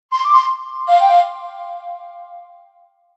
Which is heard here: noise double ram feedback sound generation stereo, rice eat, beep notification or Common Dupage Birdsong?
beep notification